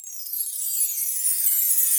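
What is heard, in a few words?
Chimes A Stick down; Latin Percussion; Drum Hits; Bell; Ableton; Factory Packs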